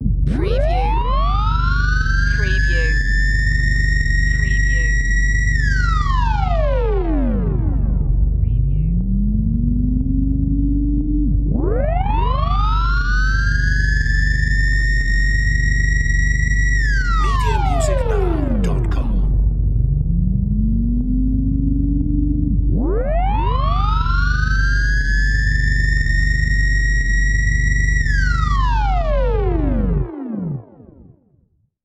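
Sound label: Alien Warning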